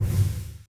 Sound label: Nail Art Charge Complete